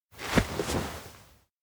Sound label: jet lift carry; anemoi